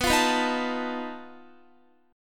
B 7th Flat 5th B Guitar; B7b5; strummed

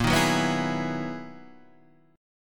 B Major 13th A sharp, guitar

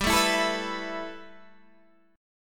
A 7th Suspended 2nd, G

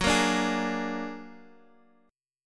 A sharp Augmented 7th F sharp

